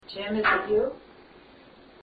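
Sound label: Sink Tap, paranormal evidence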